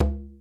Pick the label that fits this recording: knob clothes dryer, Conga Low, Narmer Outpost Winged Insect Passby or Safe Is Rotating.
Conga Low